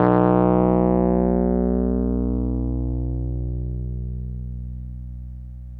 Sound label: WURLY B0, WURLITZER; Big Fish Audio; First Call Vintage Keyboards